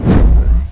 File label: health